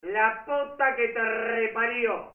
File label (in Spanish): Grito; Banco de Sonidos - Facultad de Art; Lazzeri - Gallichio - Alperte - Calvo